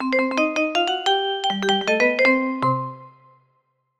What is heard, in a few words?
Jingles; Bobcat